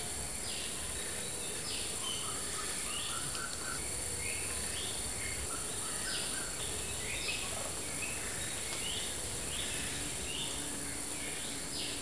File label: ambient; copan